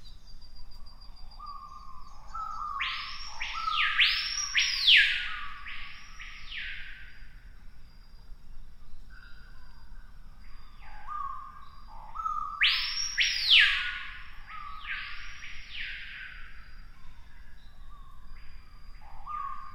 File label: piha bird scream